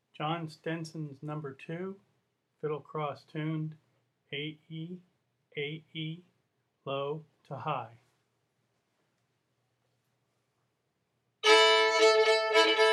fiddle; festival tunes

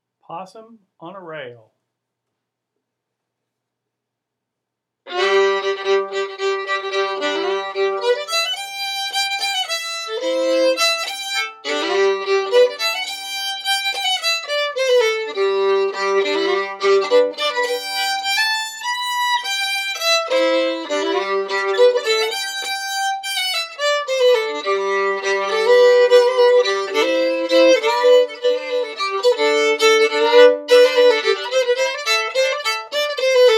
fiddle; festivaltunes

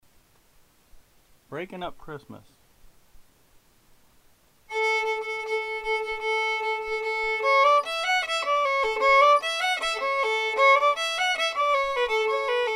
fiddle; festival tunes

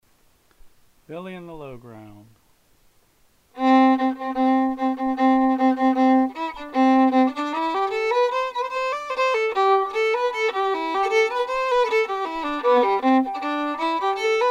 fiddle; festival tunes

